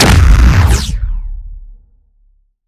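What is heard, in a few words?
Sci weapon Shot Large Laser Planet Destroyer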